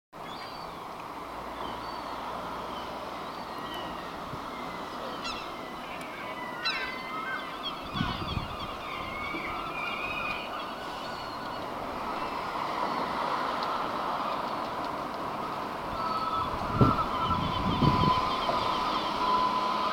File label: seagulls seaside sounds